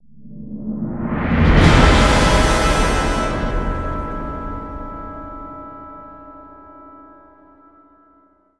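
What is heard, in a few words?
stinger roll to horns; transformers war for cybertron gamerip; MATRIX OF LEADERSHIP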